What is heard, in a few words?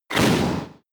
Tackle